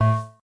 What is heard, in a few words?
answer Wrong